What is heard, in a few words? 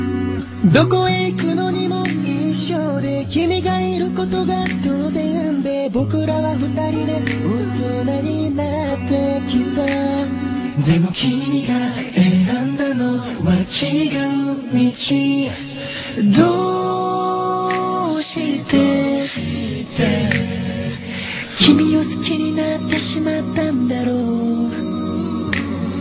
acapella